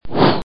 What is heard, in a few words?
ball; ambiera; coppercube3d; 3d game demo - darkness springs - mod